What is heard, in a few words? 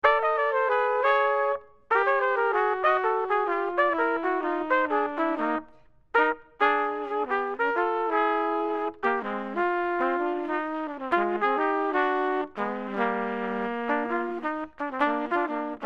duet